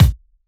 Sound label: Bassdrum; Vengeance Samples; VEE Bassdrums